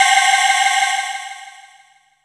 HI S GONG FX

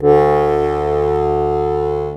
DIATON BAS, AKKORDEON, Alpine Volksmusik

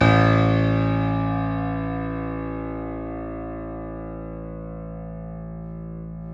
KEY, YC7; Rhythm Section

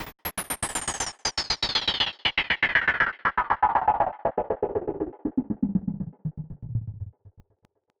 Gated Noise 120BPM; musicradar

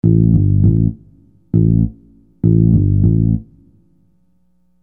Fretless Bass; Construction Kit; HIP-HOP; Sonic Foundry (Sony Creative Software)

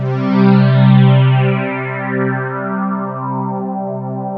SAND, ROLAND JV80 - 880; Rubber Chicken Software Sample Bank

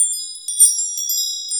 wind chime; AKAI S-Series CD-ROM Sound Library VOL-5

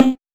Synths Root C

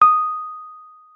WUF D#6, WURLITZER; Xsample VOL-1

